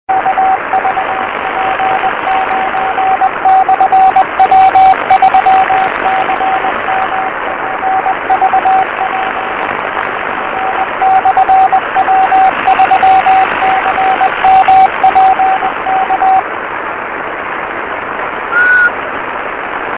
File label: CW, 6m; Recorded by IT9TYR (IW9CER old call); VP9